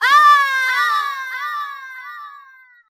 "ah", echoed

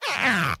fart squeaky short

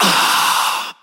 ah EXHALE; ooh ahh

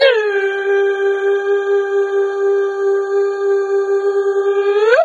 "oop", voice; ooh ahh

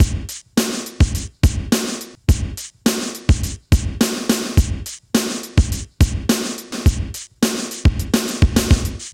schlick reek, Drum loops, Electro

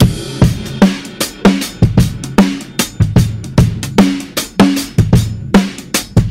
Drum loops, Boombap

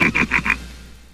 laugh satan

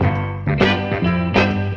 horns, Phonky loops

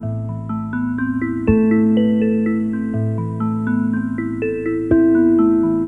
keys

